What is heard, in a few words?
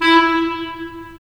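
wind clarinet; Roland - LA Composer vol. 3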